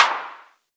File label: compressed clap, drums single hits; Claps